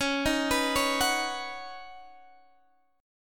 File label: Db 7th Suspended 2nd Suspended 4th Db, down, Guitar Half Step Down; Db7sus2sus4; arpeggiated